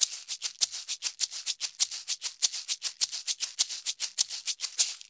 Maracas 100 bpm; Drums & Percussion; Best Service; Festa Latina VOL-1

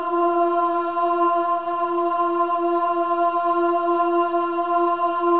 AH F4, WOMEN AHS; Spectrasonics; Symphony of Voices

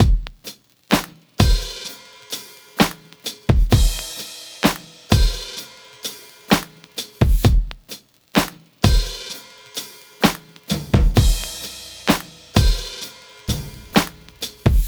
Drum Break, Trip-Hop Loops; Sonic Foundry; Rondo Brothers Trip-Hop Loops & Samples; Hooked On Hookers

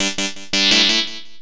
SYN LP, 170 BPM; Ueberschall; Drum & Bass Flash Trackz

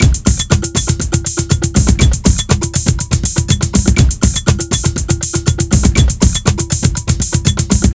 Drum Loops

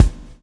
swing

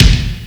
DR, DANCE KIT; 90 sSampleCDs; Crypton - DrumBase5000

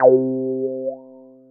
GUI MULT; EastWest; Dance Mega Hip Hop Electro

